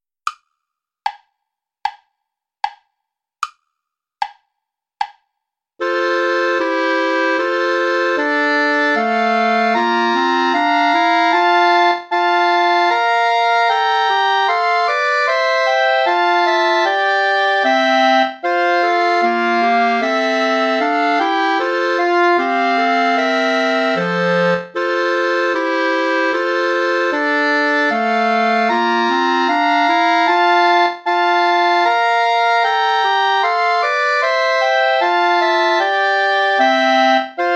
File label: play along without clarinet